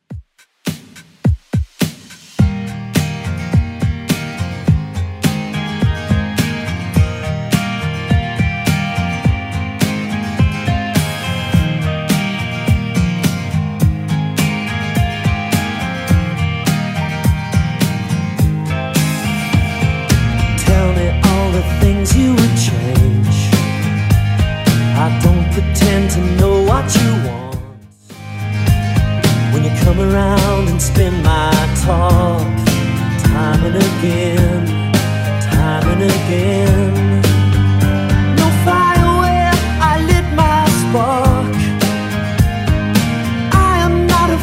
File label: 80s Redrum 105bpm